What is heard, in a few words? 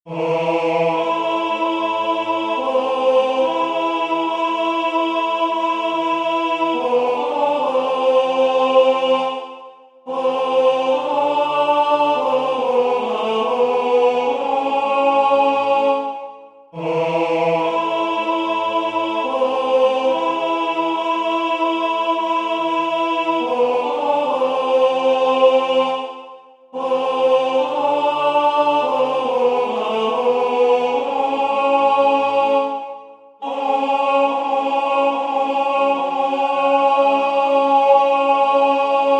tenor; Waiheke Island Choral Society